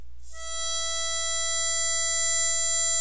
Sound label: harmonica E5